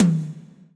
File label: Roland SC-88 High Tom